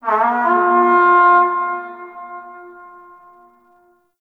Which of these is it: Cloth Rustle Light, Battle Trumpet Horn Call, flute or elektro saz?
Battle Trumpet Horn Call